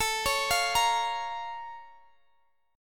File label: Bb Minor A; Bbm/A